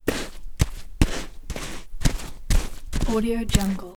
empire game footsteps single sand army soldier troops knight; warfare